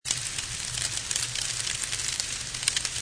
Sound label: fire, Ambient